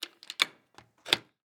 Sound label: door lock; Whodunnit